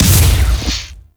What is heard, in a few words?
Ship Dual Cannon Impact; Crp Moa Dual Cannon